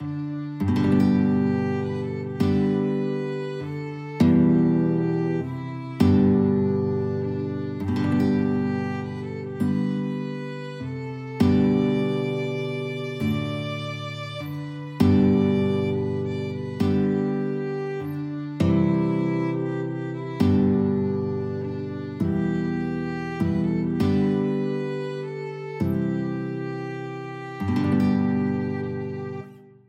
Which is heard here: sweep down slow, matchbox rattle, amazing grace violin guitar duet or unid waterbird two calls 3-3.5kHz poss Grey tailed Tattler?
amazing grace violin guitar duet